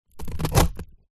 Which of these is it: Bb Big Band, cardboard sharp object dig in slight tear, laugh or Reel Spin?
cardboard sharp object dig in slight tear